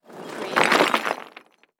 Debris Cement Move

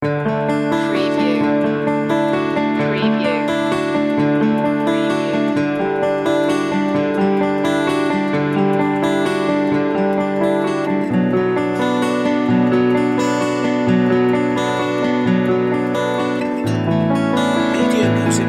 melancholic violin

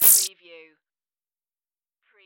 hover

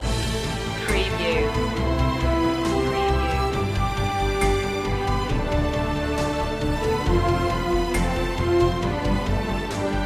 no choir

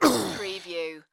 Male Grunt, Human Injury, Pain, Vocal Ugh, Ouch

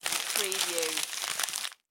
Paper Crumple Long

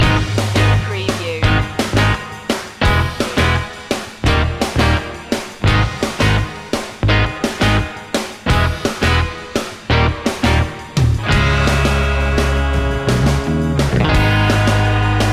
surf loop

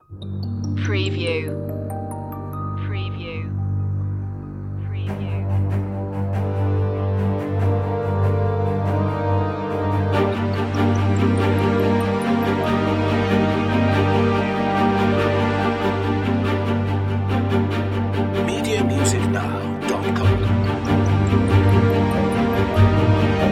magical winds no drums

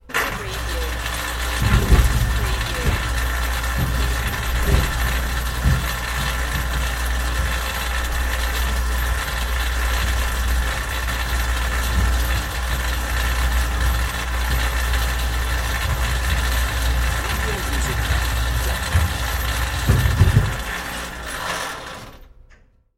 Chain Elevator Lift Start Run Stop

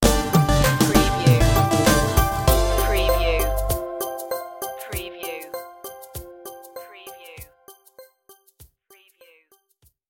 late night beach party sting

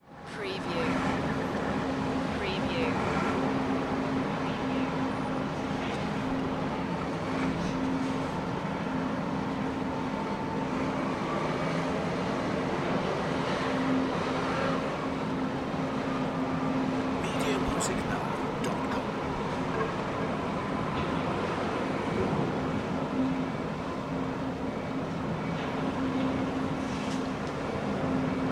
Loading Docks Truck

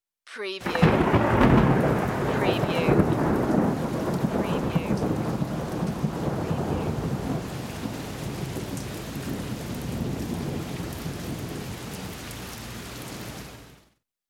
Thunder Clap

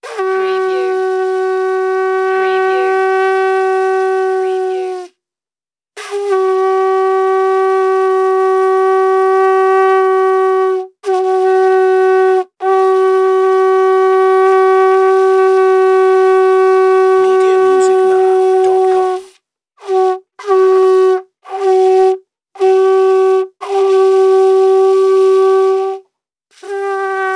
shell flute

